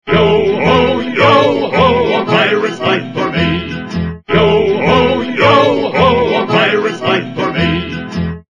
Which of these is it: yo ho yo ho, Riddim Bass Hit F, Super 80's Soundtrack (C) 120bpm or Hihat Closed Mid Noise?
yo ho yo ho